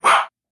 Small dog chihuahua single bark

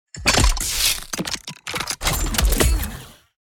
Cybernetic transformers robot transformation

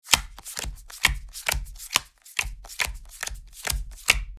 Board game dealing cards; leisure

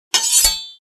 Draw sword from scabbard

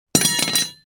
Dropping wrenches on the floor

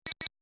Computer beeps digital menu confirm; sci-fi

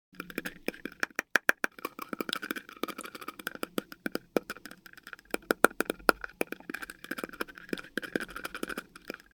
Teeth chattering freezing cold

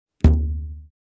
Cartoon elastic pluck wobble; boing

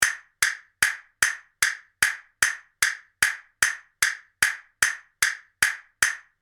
Click metronome 150 bpm; gfxsounds

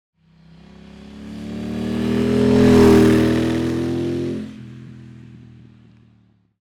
Motorbike pass by medium speed; vehicle

